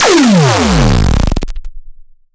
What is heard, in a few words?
Fx Impact; Fx Impact Intelligence